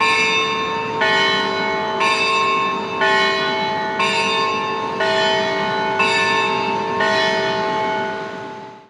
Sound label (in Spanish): campanas reloj palacio municipal, rosario